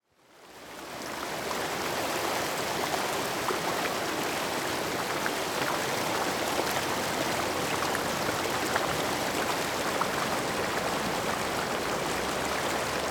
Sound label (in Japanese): togawa no mizuoto; 砥川の水音